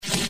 tear; monster shop

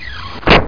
CL DOOR

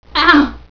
beep

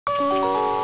Menu Popup; Disneyland

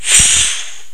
player launch; Macworld (1998-10); Games World; Shareware Games; Arcade; idin 2.0

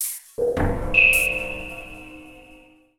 PERCUSSN, AMBNT, 160; eJay; Ambient; Drum

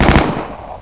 UZI FIRE; sw demo

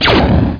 imp blst

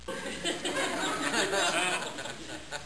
Giggles Only Medium Crowd; Soundsation; Human

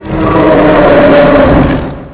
monster; Grounch

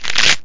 collect; boulderdäsh; graveyard

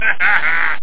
hahaha; Your Choice Software Collection 3

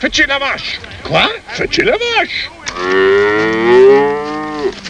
MOO

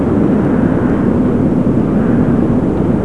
hum; Nuclear Hammer

